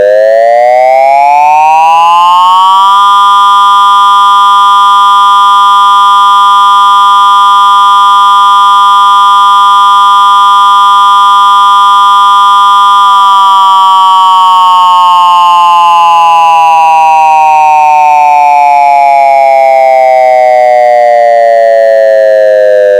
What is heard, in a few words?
Short Steady